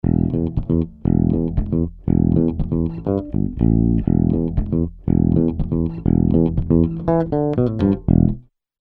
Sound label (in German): E-Bass Light Drive Transformer; SSL Fusion